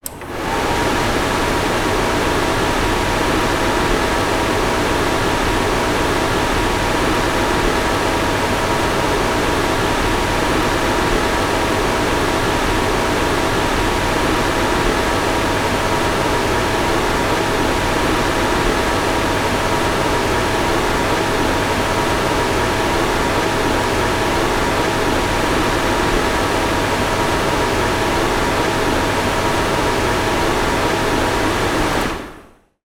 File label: industrial air curtain machine on run long off